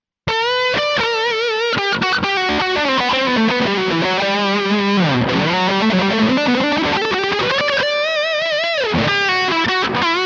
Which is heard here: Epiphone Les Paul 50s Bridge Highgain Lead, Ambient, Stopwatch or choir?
Epiphone Les Paul 50s Bridge Highgain Lead